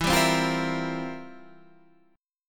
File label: C 11th E